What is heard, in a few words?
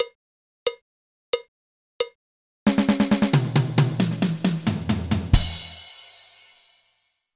fill triolet ghost note